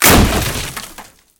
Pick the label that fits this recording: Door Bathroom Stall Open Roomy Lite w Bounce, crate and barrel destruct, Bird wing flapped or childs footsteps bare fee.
crate and barrel destruct